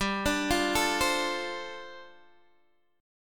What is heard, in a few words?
G 9th Suspended 4th, Guitar Open D